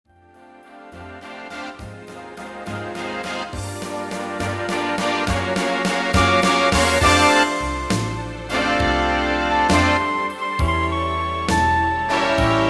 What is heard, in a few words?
music horn sample midi